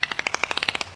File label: dolphin; minecraft; technic